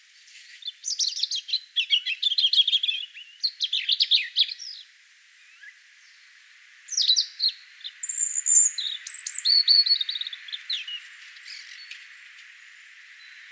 rotkehlchen gesang; vogelstimmen; nabu; lars lachmann

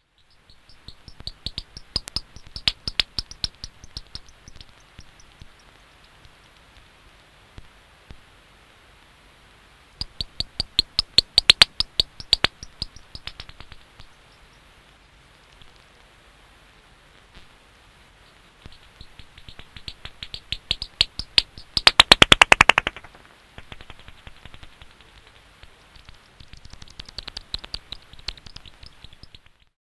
Rauhhautfledermaus

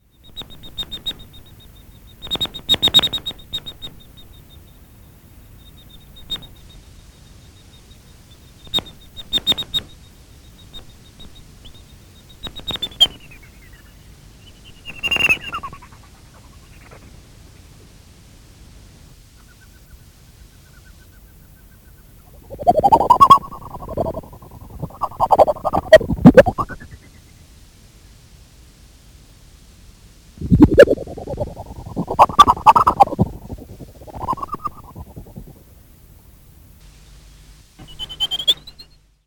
Gr Hufeisennase, Fledermausrufe

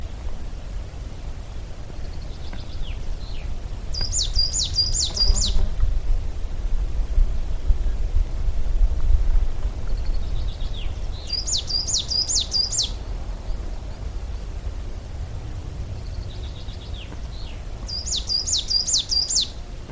tannenmeise gesang; vogelstimmen; nabu; lars lachmann

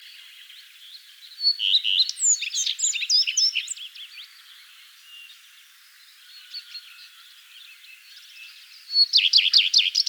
gartenrotschwanz gesang; vogelstimmen; nabu; lars lachmann

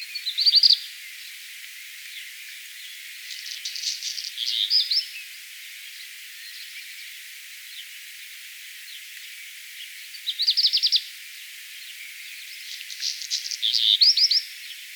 hausrotschwanz gesang; vogelstimmen; nabu; lars lachmann

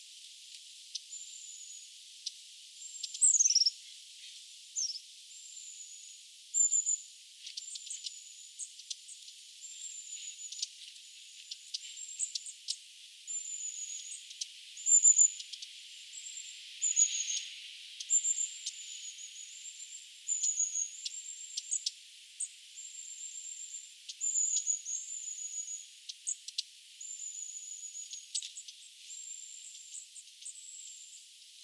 schwanzmeise; vogelstimmen; nabu; lars lachmann

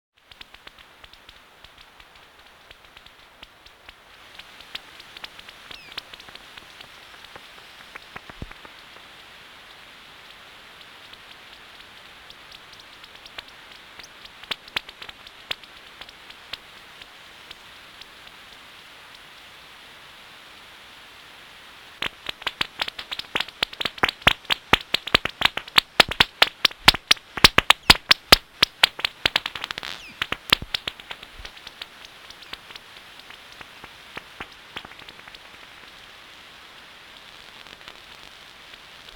Zweifarbfledermaus, Fledermausrufe

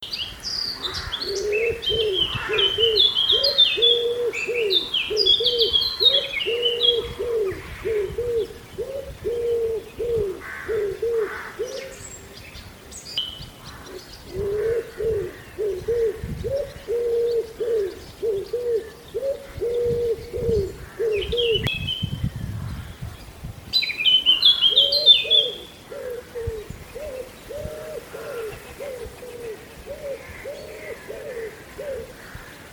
ringeltaube gesang; vogelstimmen; nabu; lars lachmann

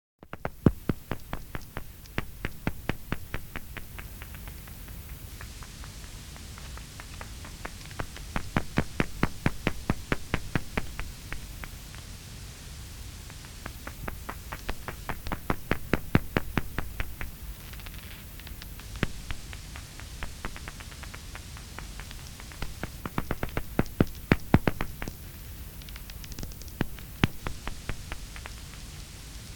Mopsfledermaus, Fledermausrufe

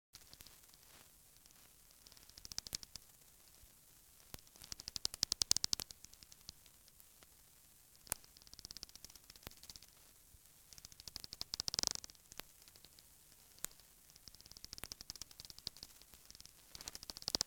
Wimpernfledermaus, Fledermausrufe

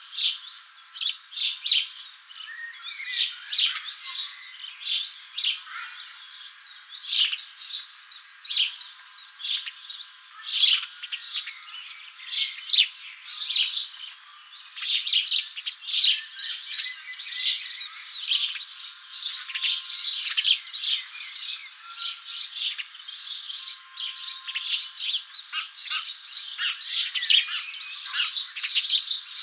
haussperling; vogelstimmen; nabu; lars lachmann

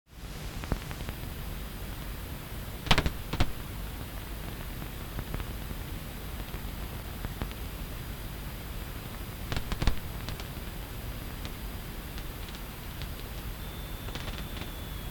Langohren

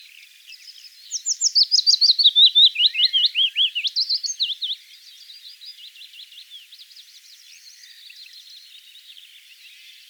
fitis gesang; vogelstimmen; nabu; lars lachmann

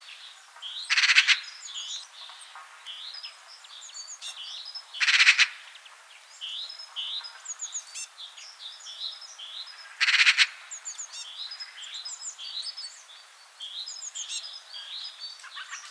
elster ruf gesang; vogelstimmen; nabu; lars lachmann

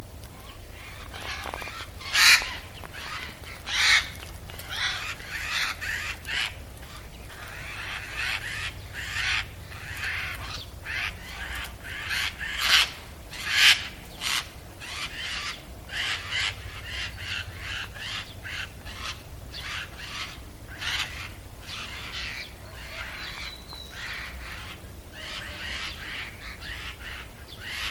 eichelhaeher ruf; vogelstimmen; nabu; lars lachmann

